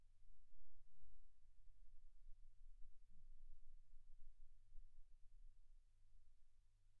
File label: silence 48kHz